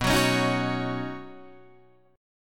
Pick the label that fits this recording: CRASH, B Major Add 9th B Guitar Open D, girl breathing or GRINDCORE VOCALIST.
B Major Add 9th B Guitar Open D